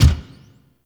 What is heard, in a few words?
Gear Shift Up, Motorcycle; Warframe; Vehicles; Motorcycle Ninety Nine